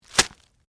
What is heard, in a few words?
Impact Cabbage Head, Drop, On, Cabbage, Leaf, Pile; Texture; Vegetable; Drop Sound Effects